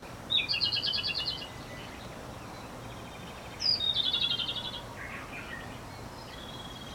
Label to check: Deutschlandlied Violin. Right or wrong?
Wrong